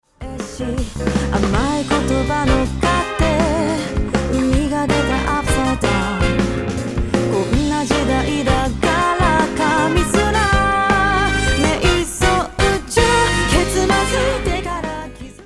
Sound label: Horn Arr Back All